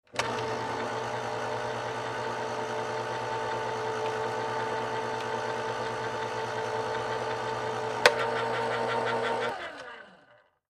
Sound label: Can Opener Electric; utensils